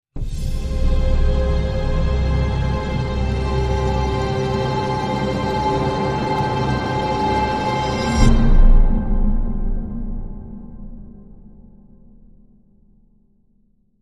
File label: End Sting